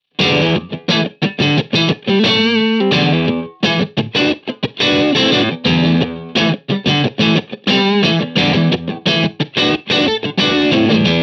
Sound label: 412 Big Rock; Torpedo Captor X SE; Pete Thorn; Tanger